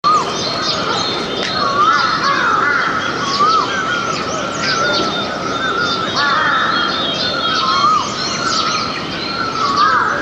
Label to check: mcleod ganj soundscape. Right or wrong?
Right